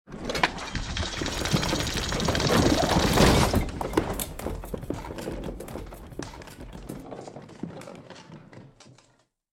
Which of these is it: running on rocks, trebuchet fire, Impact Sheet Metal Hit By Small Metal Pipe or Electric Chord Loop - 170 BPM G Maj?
trebuchet fire